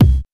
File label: punchy kickdrum c key